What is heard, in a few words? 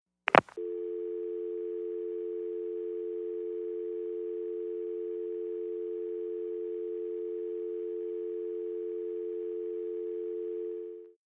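Telephone Hang Up Dial Tone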